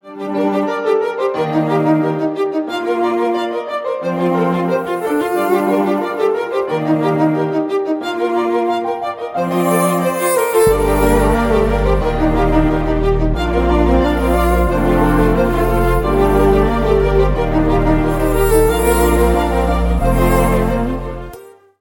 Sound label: violin ringtone